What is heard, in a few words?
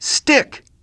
STICK